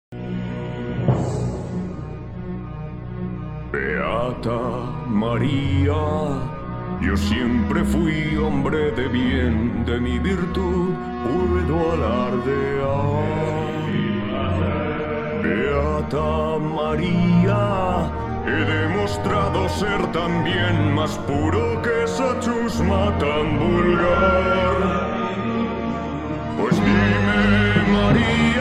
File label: EU Spanish Male Singer